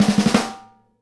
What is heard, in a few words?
Live Snares; E-MU Producer Series Vol. 8 – Platinum Phatt